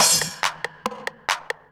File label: Groove 140; Dance Mega Add On Grooves